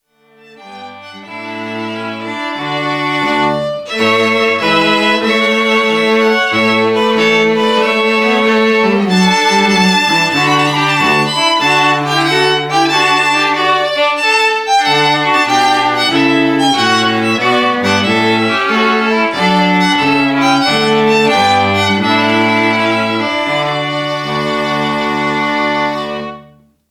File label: Trumpet Tune